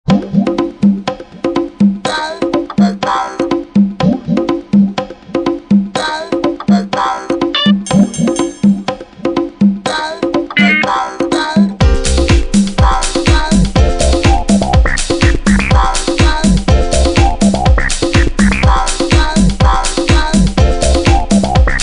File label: bongos music